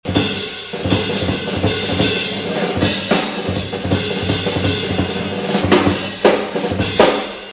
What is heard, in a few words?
drumsolo